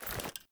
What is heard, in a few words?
Foley Run Default, Foley Default Clothing; Characters; Player